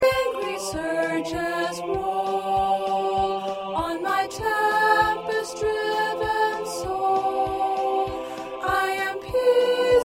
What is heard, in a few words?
Soprano, choir parts